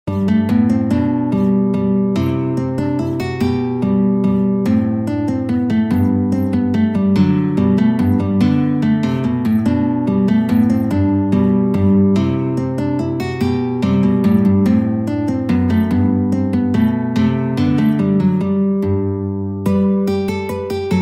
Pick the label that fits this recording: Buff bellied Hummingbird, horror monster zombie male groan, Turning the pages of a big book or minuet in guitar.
minuet in guitar